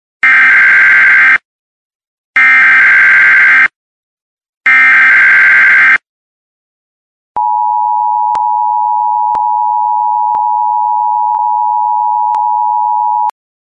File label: emergency ringtone